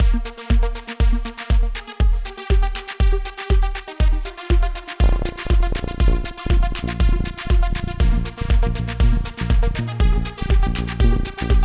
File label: Digital Chanter Xpand, bagpipes